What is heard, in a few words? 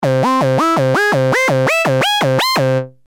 porta; 時間は一定; nord; ポルタメントはアナログシンセリードに欠かせないパラメータだ。ノード系のこれは時間をコントロールするので、インターバルがどれだけ離れても時間は一定